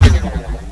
saw fire

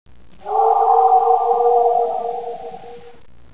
howl; SecretWorld

